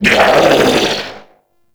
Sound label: Scream; Warframe; Amalgam Override; Corpus